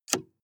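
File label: sports car interior ventilation adjust hard; verkehr transport; autos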